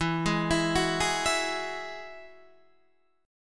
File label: E Major Add 9th, Guitar DADGAD